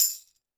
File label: percussion, Roland TD-20